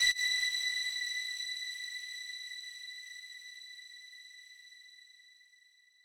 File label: lead